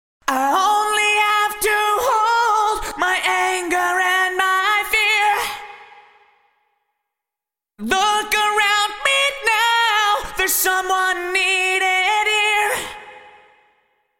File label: Vocals Bricasti Rooms 2 Deep Chamber Vintage Digital